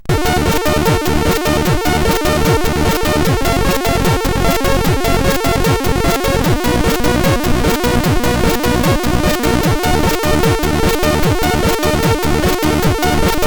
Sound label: MicroTrance sid CSG8580R5